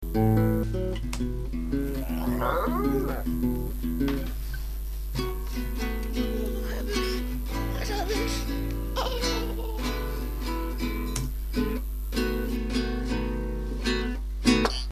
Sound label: blues